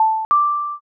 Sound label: intercom